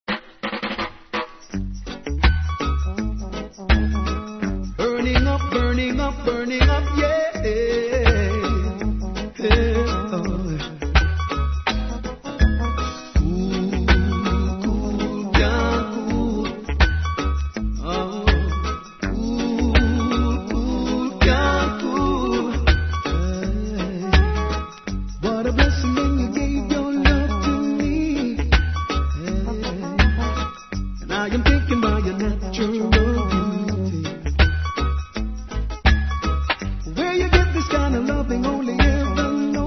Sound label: riddim